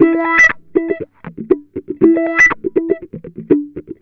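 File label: wah funk; Jazzistic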